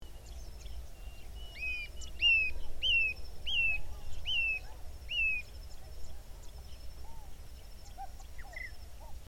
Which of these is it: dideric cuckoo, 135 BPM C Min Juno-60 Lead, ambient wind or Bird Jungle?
dideric cuckoo